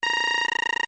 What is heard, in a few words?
Computer Working, beeps & tones